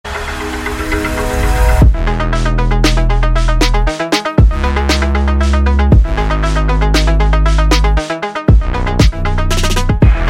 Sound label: EDM; Stranger Things